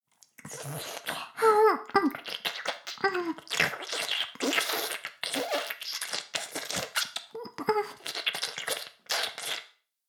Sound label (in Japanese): フェラ音声; 古き城のドラゴニート2